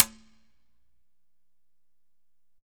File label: Congas wet, drums percussion